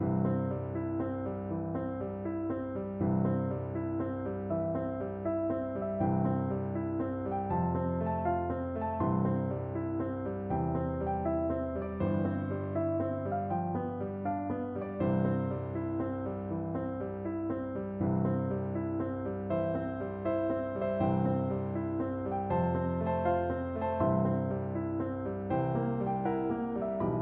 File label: o holy night piano duet